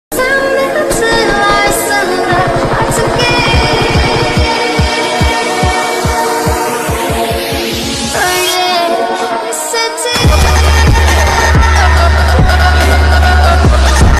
pubg lite ringtone, pubg mobile ringtone

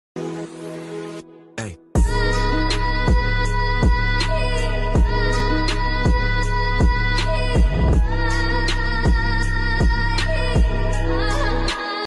mood off sad ringtone

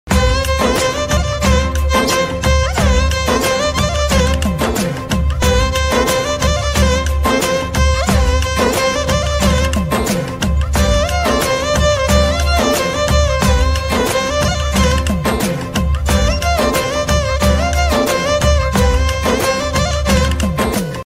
song bgm violen ringtone; fidaa movie; sekhar kammula; sai pallavi

